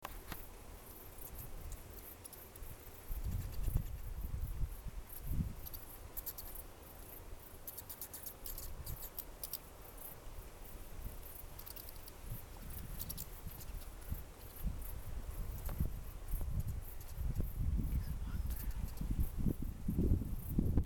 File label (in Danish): dvaergflagermus sociale lyde i koloni